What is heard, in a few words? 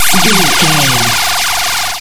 retro laser beam